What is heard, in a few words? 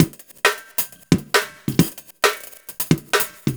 Drum, Breakbeat